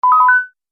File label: Multimedia Correct, beep; Andrew Fleming